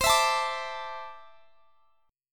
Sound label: C 6th Add 9th, C, down, Guitar, Standard; strummed; capo 3